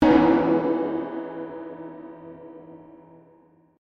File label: Piano hit; Among the Sleep